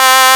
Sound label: Synths Root C; Synth Shots and Basses; Vengeance - Essential Clubsounds Vol.4; Note C